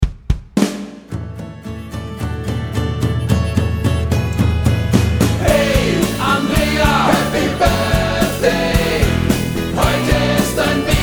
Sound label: Happy Birthday Andrea